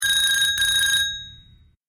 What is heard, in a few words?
Ring Classic